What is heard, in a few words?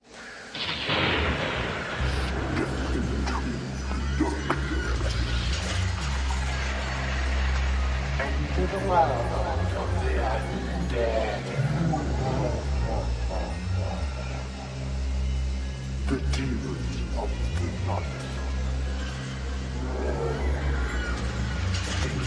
HALLOWEEN VOX